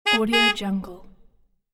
sports car double blast horn

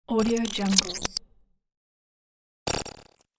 digital computer morph particle transition

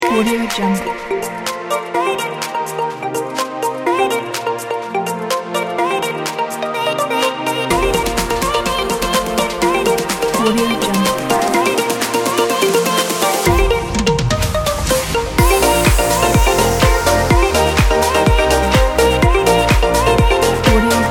summer upbeat background dance pop

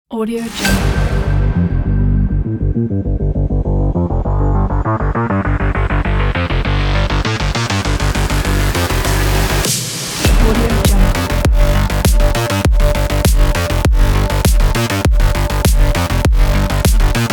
technology robot bass

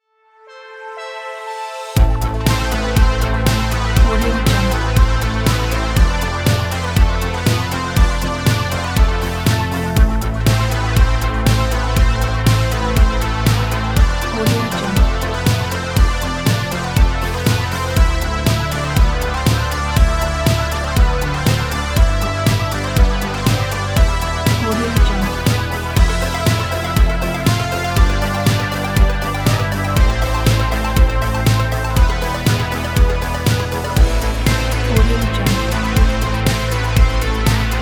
dramatic retro wave

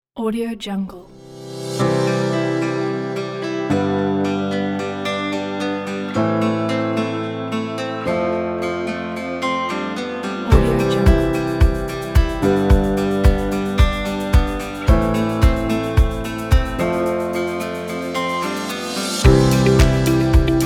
spring commercial corporate music pack

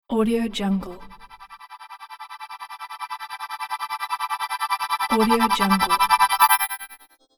tremolo transition movement

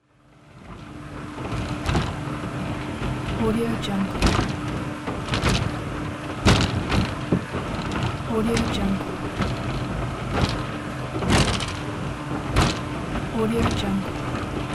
interior boat slow speed

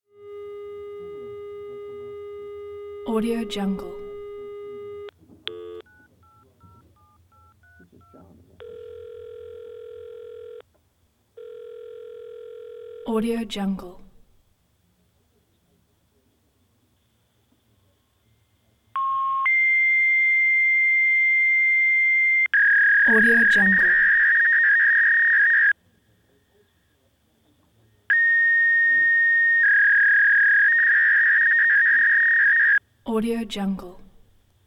fax machine tone dial touch beeps

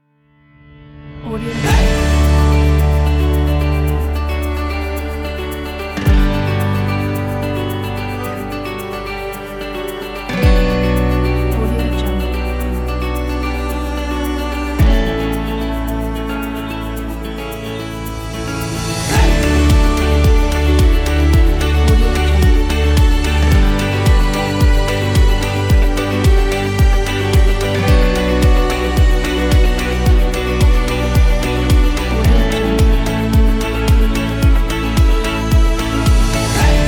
happy folk acoustic

